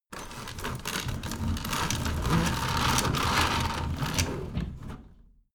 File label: window roller shutter open fast